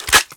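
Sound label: Weapon shotgun reload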